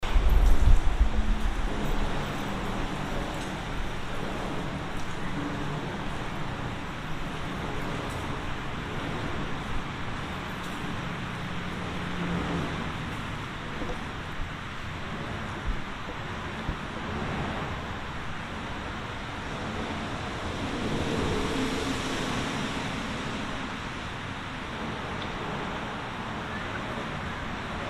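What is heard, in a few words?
Chicago Lake Shore Drive Tunnel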